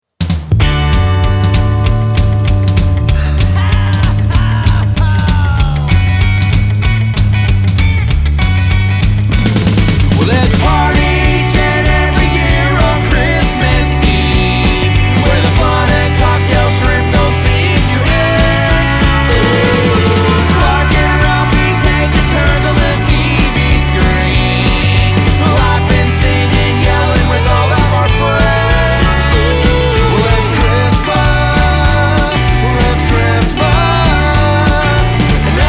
jingle bells

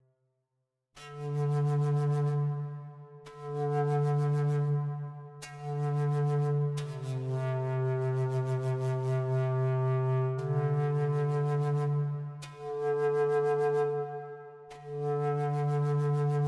native american flute

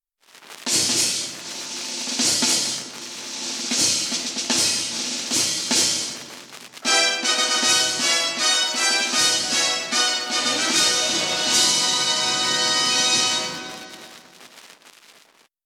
silent orch fanfare

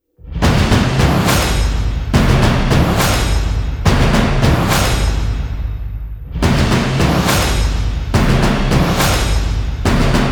drums

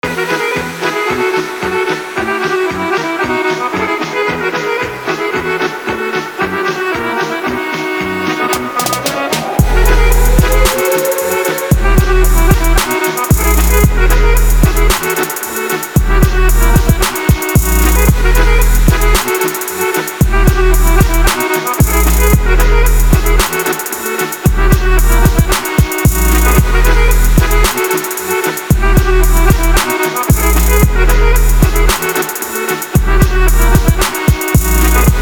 rap beat

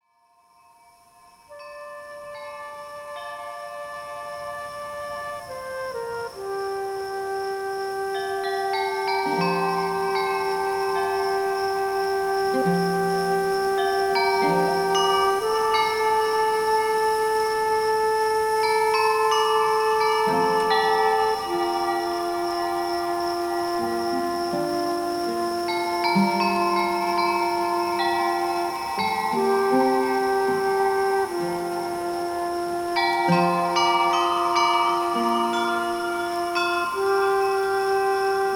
summer forest with turkish flute; healing power natural sounds oasis

